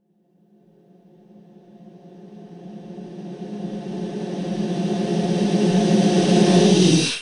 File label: Intro 130BPM FX